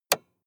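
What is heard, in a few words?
sports car interior switch on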